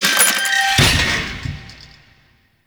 Lift Hinge; Warframe; Duviri

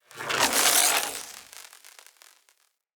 Voltaic Weapon Electricity Whoosh; Weapons; Enhancements; Elemental

